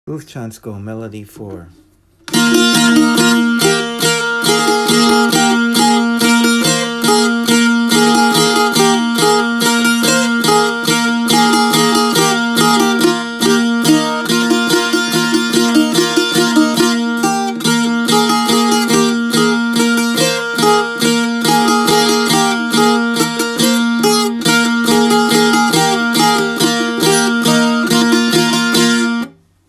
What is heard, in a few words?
tambura; Bufcansko